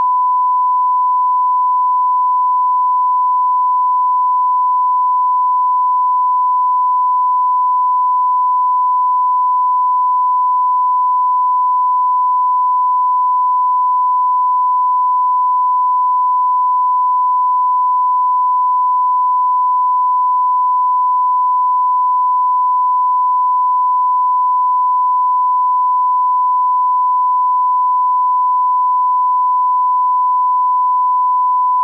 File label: 1kHz @ -10dB, test tones; rockford fosgate setup disc